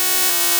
Lock On; attack moves; pokemon; gen 2; gsc

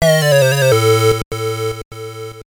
Death; Batman Returns; game gear

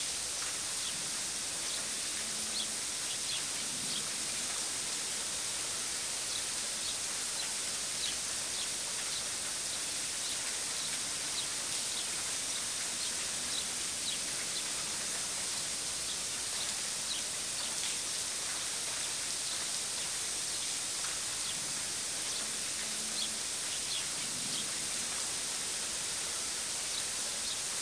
STATION MORN

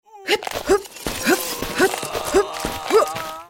Trample Heal; Goddess of Trampling

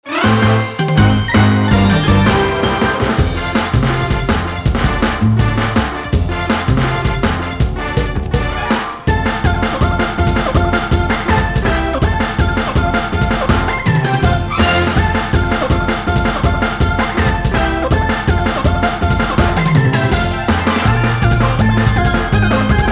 Casino Slot; Fortune Street; wii; Mario

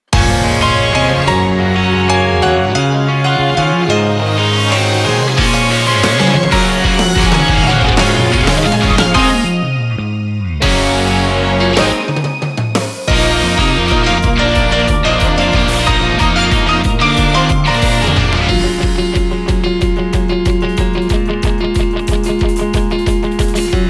(without vocals)

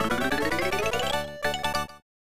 Complete Section; Super James Pond 2; SNES

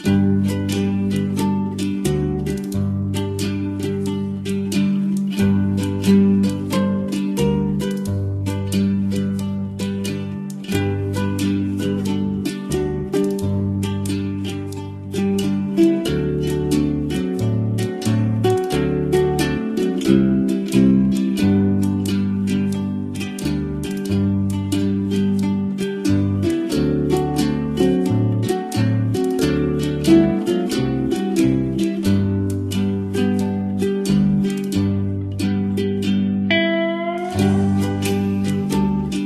Sandbox Background Music; Pixel Gun 3D

